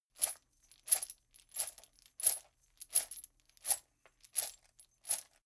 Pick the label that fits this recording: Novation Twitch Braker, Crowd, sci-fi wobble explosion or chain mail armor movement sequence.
chain mail armor movement sequence